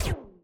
shoot Laser; Mindustry

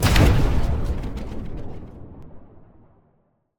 block Explode Explosive Alt; explosions; Mindustry

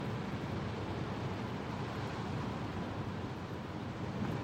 ship Move Big, movement; Mindustry

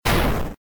bang; Mindustry

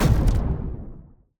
explosion plasma small; Mindustry

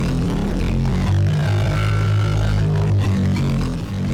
beam Plasma Small; beams; Mindustry

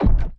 mass driver Receive; Mindustry

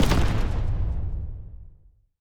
unit Explode; explosions; Mindustry

